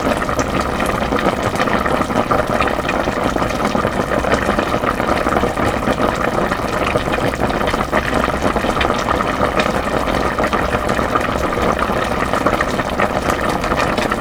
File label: Simmer